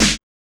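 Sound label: HSE-SD, house snare drum; X-Static Goldmine 1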